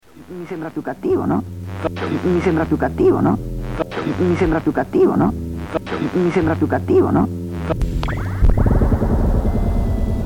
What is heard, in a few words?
noise suite